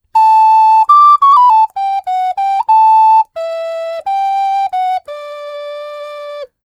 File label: jingle, ocarina